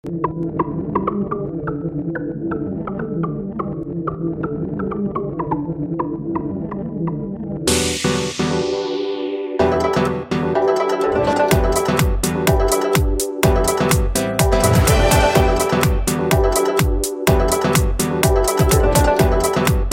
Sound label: electric dancefloor